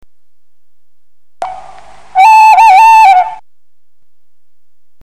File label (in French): hibou des marais